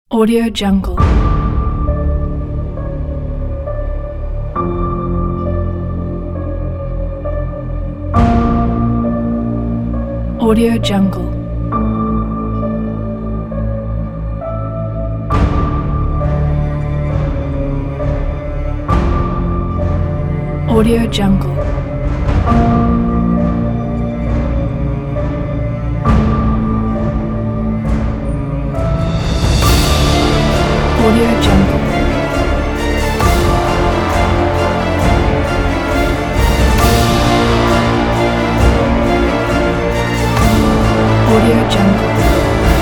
dark epic cinematic trailer

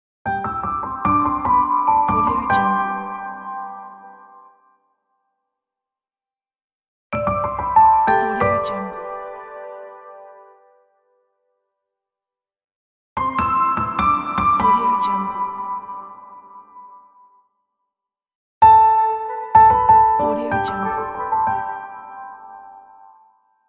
modern piano corporate logos pack

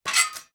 wrought iron scrape

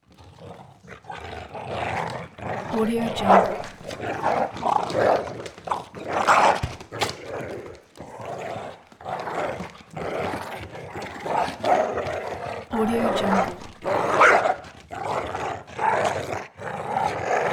dog fight

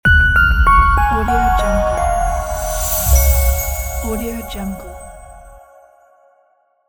bells logo; kirillluzin

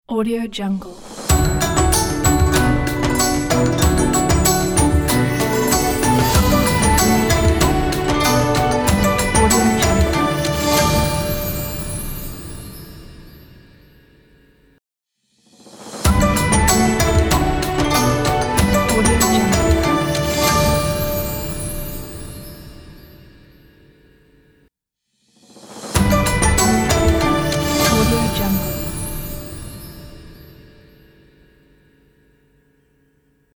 asian logo

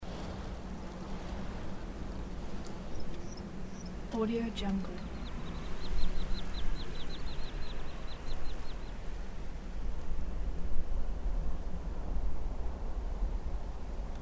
desert ambience loop

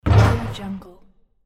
basketball hitting backboard

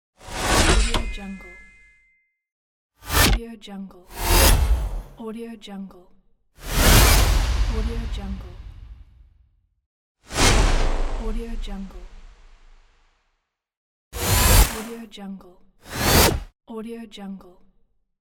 whooshes game pack; vfimusic

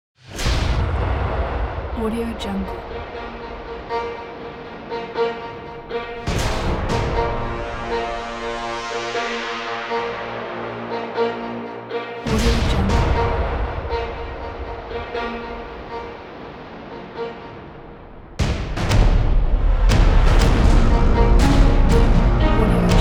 epic action and suspense

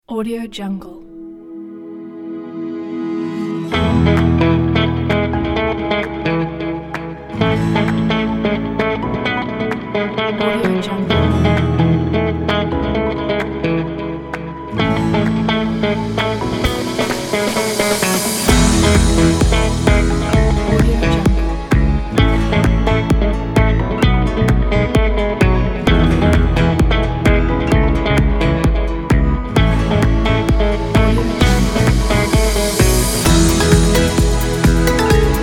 summer corporation kit

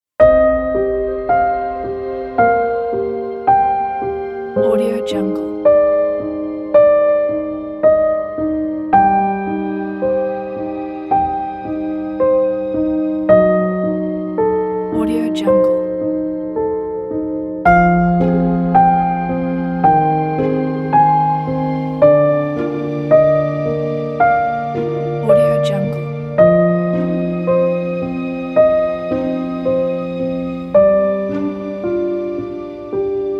an emotional piano film score; melodrama-studio

